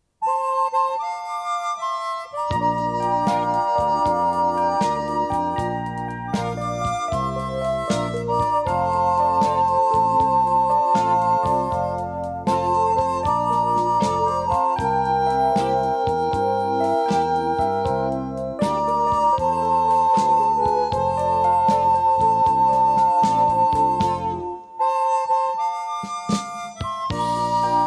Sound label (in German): Abschiedsblues